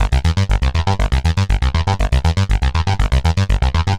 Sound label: Arpeggio Bass, Prototechno; Sonic Foundry (Sony Creative Software); Basses